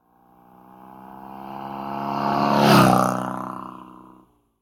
motorbike; Blind Drive

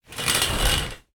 Shower Curtain Close, Bathroom; 1001 Sound Effects

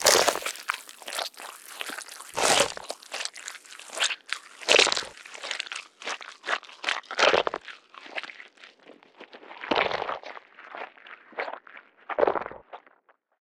zombie eating; DeadFright

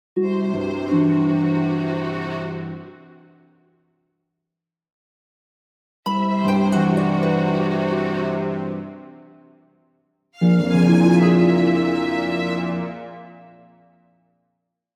Orchestral Jingle Negative; boom library; The Interface

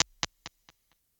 282 Delay TAPE, Impulse Responses; Reverbs; PastToFutureReverbs; SST; CLA; IRs

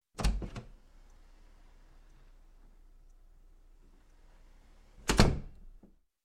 Door, Wood Open And Close; Sound Ideas 2000 Series

